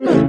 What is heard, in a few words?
no pose, dog; There; ThereClient